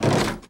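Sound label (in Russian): скрипучий короткий звук закрывающегося ящика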